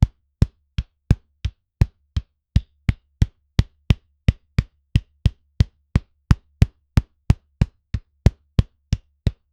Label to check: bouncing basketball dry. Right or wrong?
Right